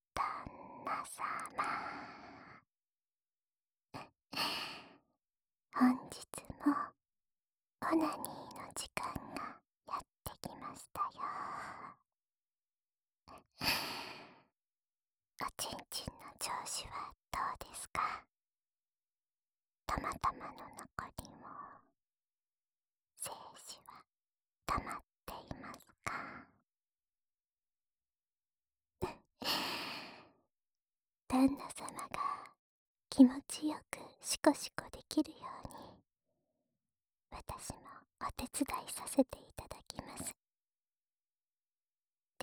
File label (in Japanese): オナニー用耳舐めループ（左耳）, ASMR